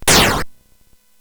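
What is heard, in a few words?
Nebulus, MOS 8580 SID chip music, games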